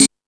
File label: Hip House, drum machine